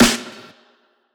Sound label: snr hat